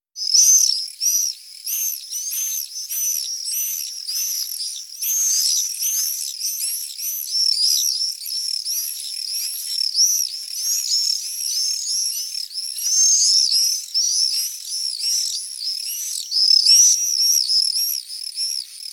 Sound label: apus apus; ansiedeln; nistkasten; klangattrappen